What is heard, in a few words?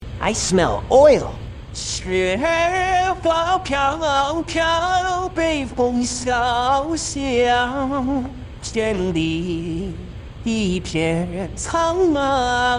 sings in chinese; sonic